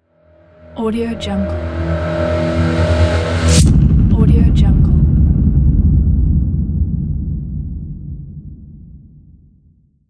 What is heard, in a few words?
epic impact; transitions and movement